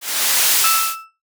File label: film burn flare